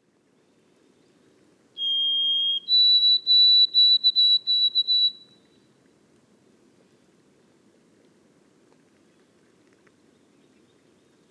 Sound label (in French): bruant a gorge blanche foret boreale; de la haute cote nord